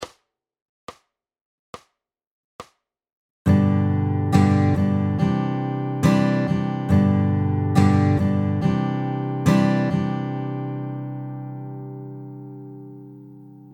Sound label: varying strum patterns accent